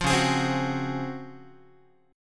Db Major 11th down Guitar; strummed; JGuitar

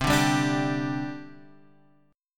G 5th C

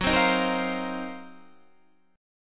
D sharp Minor 6th Add 9th F sharp

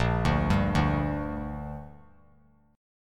A sharp 6th; arpeggiated; JGuitar

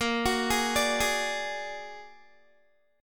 Bb Augmented 7th Bb Guitar, chord; Bb+7; arpeggiated; 18,21,18,19,20,x; 4E,4A,5D,5G,5C,6F

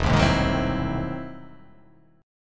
E Augmented Major 9th; strummed; E+M9; JGuitar

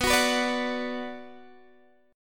B Minor B Guitar DADGAD

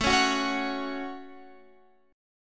A Major Double Sharp 5th

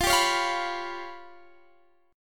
G 7th Flat 5th

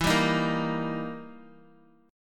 E Minor Major 7th Flat 5th, E, down, Guitar; strummed; JGuitar

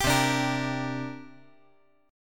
C 7th Suspended 2nd

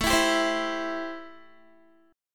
A-Minor 7th A Guitar; 16,19,19,17,16,x; strummed; Am7

